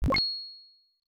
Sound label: Coin; Wardner no Mori; Toaplan; arcade